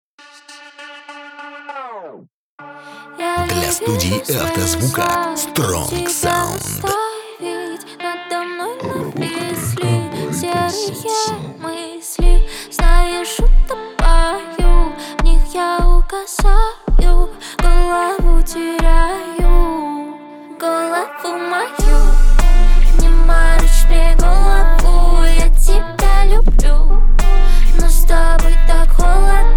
23-26Hz Low Bass

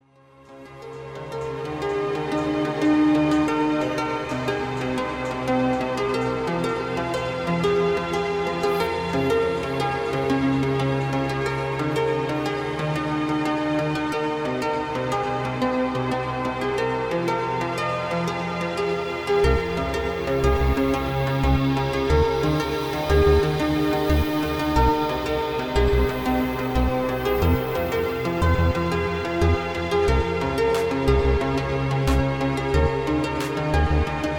Soulful Soundscape mix

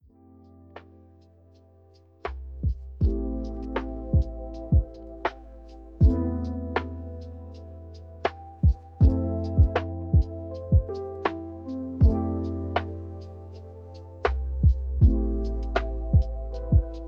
Lofi Music

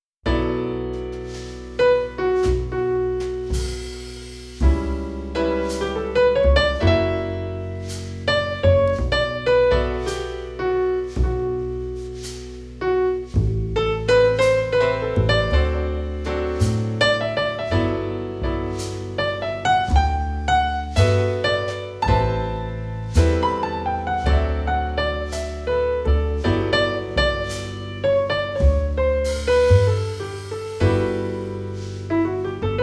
Jazz Lounge

